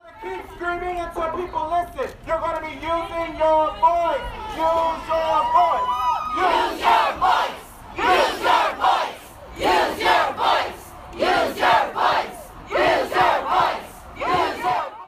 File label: chant